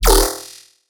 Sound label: Bass One Shot D#, Dubstep; Synths; Raptor; Cymatics; Dubstep Starter Pack